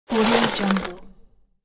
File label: plastic bricks; domestic sounds